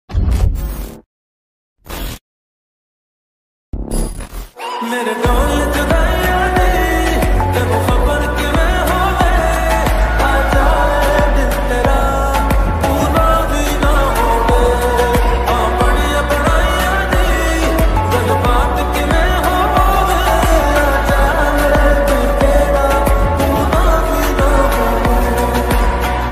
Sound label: lofi ringtone; tamil